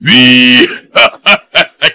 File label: wihahaha